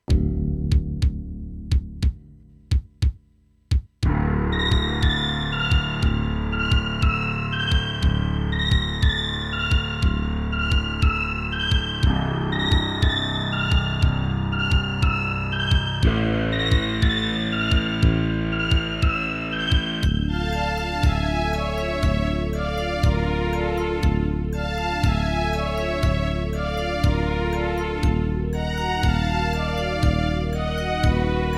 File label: SC880; Dune 2